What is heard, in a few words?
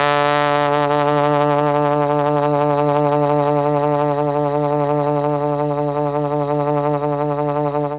D2 TRMB TRMP, Mellotron; Mike Pinder - Mellotron Archive CD; TROMB+TRMPT